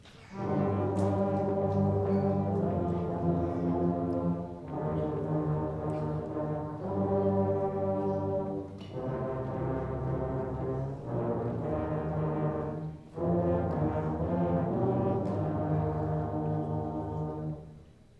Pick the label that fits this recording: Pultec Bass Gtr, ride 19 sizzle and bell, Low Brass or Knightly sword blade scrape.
Low Brass